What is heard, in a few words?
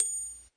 typewriter bell; Weird and Interesting Hits; single hits